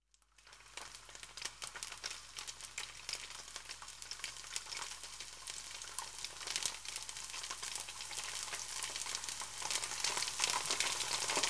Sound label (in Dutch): Braadgesis; KMP Film Video Sound Effects